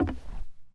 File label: woodpanel, Footsteps; zps; Foley